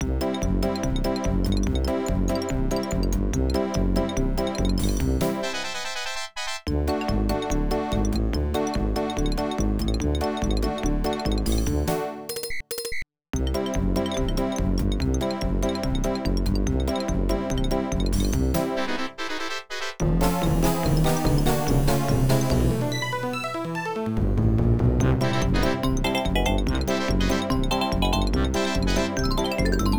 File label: FUN CHASE